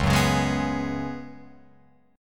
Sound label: Db 7th Suspended 4th, Guitar, Open F, chord; JGuitar